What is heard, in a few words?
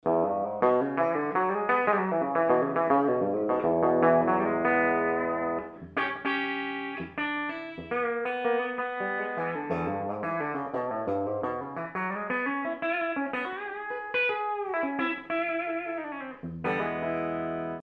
bridge, guitars